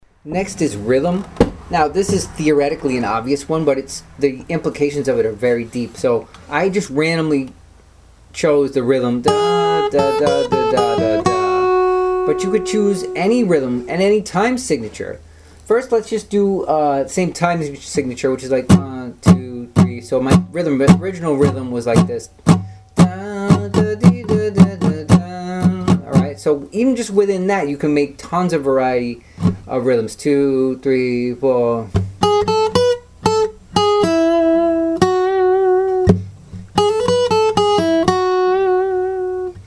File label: varying a melody